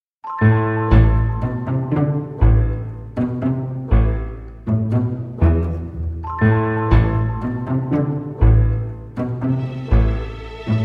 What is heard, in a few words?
Piano and Strings